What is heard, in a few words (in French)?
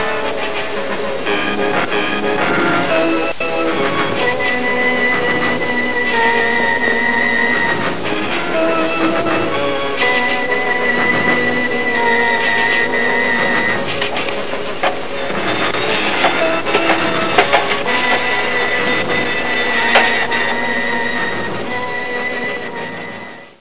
bombe; invaders